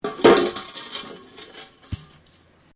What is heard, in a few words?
METAL POLES CRASH; Metal (Sound Effects:Materials)